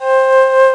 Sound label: FLUTE; 17 Bit Software 1: Collection A